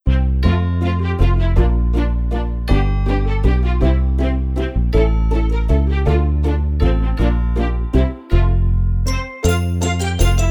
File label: We Wish You A Merry Christmas